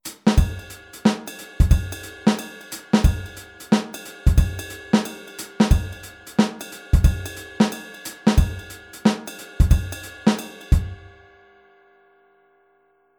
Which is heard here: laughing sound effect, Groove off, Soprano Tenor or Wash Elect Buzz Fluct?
Groove off